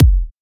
Kick

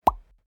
Pop

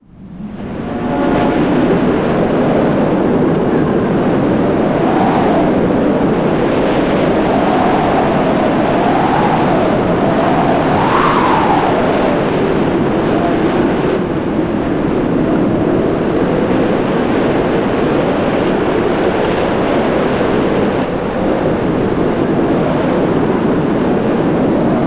Sand Storm

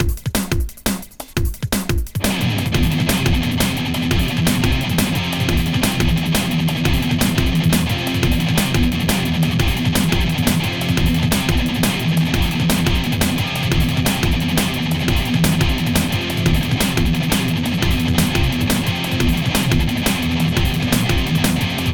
Metal Jungle

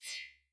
Swipe

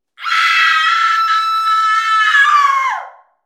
Woman Screaming

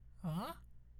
Question Noise

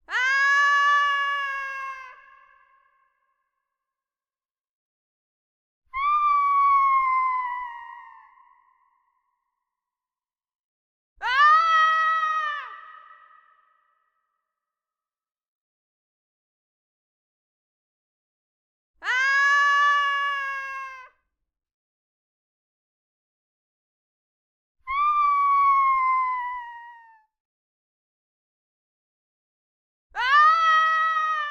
Female Falling Screams

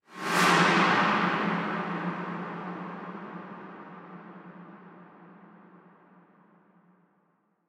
Deep Swoosh

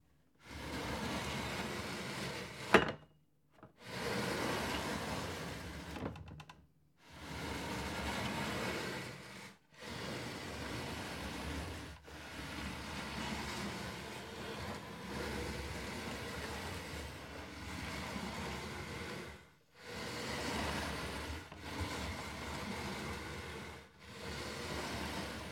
Drag Cupboard

